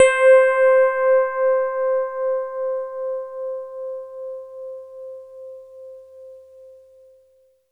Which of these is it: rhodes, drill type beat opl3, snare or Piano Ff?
rhodes